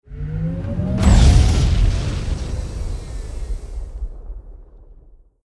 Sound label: Notification Railgun; Tanki Online